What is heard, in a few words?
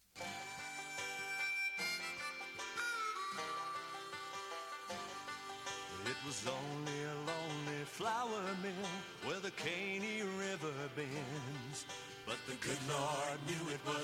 JINGLE